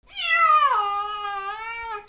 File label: ANIMALS